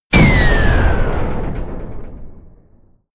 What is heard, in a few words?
sci-fi plasma cannon explode